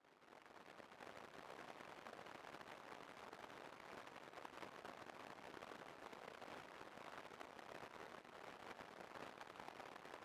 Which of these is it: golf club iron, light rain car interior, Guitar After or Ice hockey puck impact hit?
light rain car interior